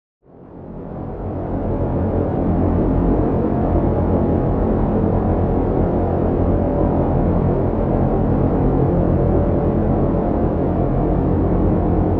Dark Drone; Mechanical Wave; Sci-Fi-Invaders

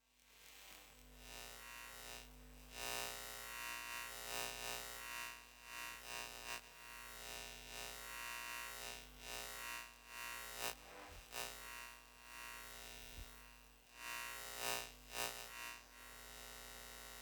Unseen Noises; USO

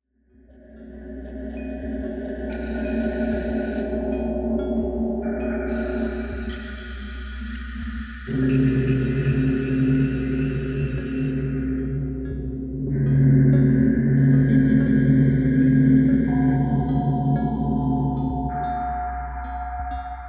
Atmospheric; 99Sounds; The Weird Side Samples

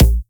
Kick, Data Mosh Hits; 99Sounds; Mangling Audio

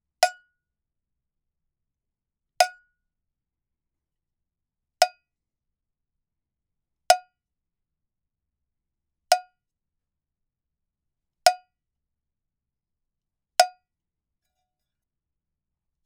MODERN LP BELL COWBELL STRAIGHT MUTED STICK HITS; GDC 2018 - Game Audio Bundle; The Chris Alan - Musical Oddities - Bells, Whistles, Horns, Percussion and More

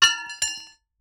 pipe drop, Metal Elements; Sounds Great

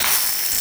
ELECTRIC Shock Zap Short; GameBurp; 2000 Game SFX

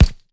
Punching Percussion, Straight Glove (Chain), raw

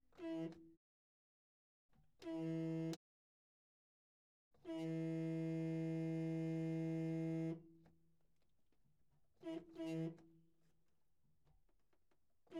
Toyota Rally Car, Horn, Various, Interior; Corolla 1998

